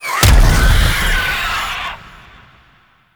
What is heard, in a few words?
Infested Drop Pod Impact; Warframe